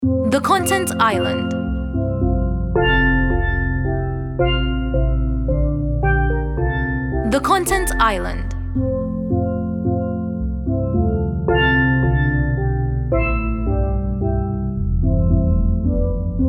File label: Hark The Herald Solo